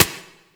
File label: Turret Low Clip; weapons; Lasrian APC; Warframe